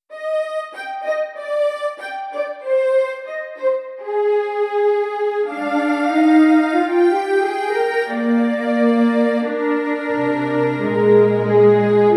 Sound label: Symphonic Loop; Adorn Audio Project